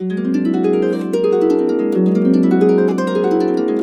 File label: GB DREAM, Harps